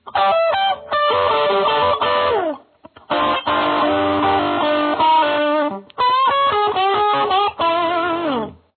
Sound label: solo